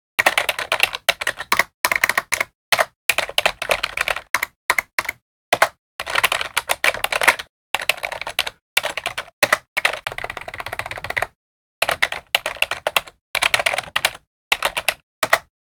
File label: gear